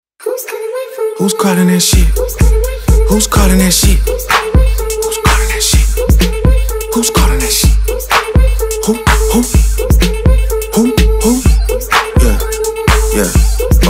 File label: NOKIA Ringtone